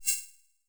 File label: Slider